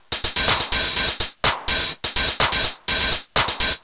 drum fortune top 125, Drum Loops, Classic Chicago House